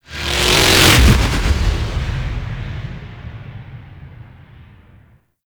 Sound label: Ship Fly By Quick; Vehicles; Warframe; Liset; Liset Flight; Grineer Ship Liset